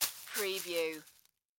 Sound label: Hay Object Drop; sound-effects-bible